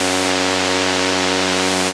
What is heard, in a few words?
F Lead